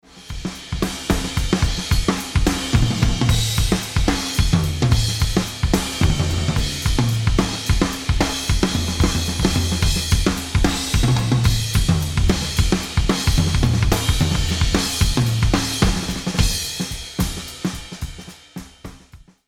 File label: Mix Drums Tom